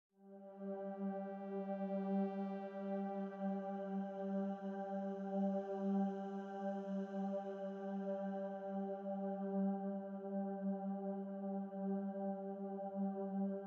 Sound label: Desert Music, G3